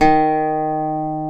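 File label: Bouzouki D#2 right; AKAI S6000 CD-ROM - WorldSounds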